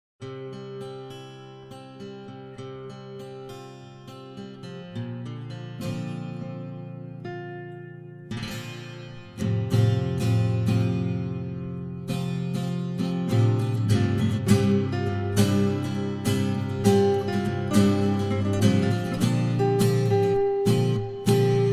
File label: Ac Guitar